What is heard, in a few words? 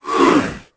roar